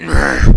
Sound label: pain; Alien Arena; xenoid